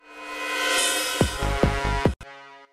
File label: Bass Thrill Apple Message Ringtone